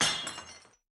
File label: Break Ceramic